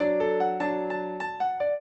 trio, piano; Mozart

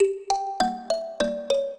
minuet, mbira; Mozart

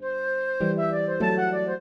trio, flute harp; Mozart

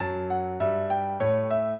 minuet, piano; Mozart

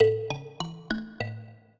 minuet, mbira; Mozart

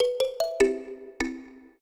trio, mbira; Mozart

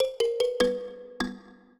trio, mbira; Mozart

